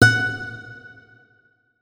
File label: notes, guitar; Hideout Furniture